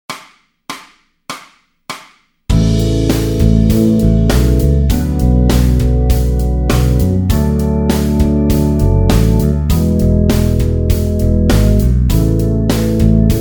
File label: backing